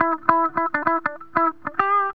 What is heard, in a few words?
GTR; Zero-G; Funky Elements